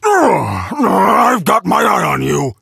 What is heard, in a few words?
hurt vo